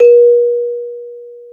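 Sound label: BEL CELES, celesta; Roland - LA Composer vol. 1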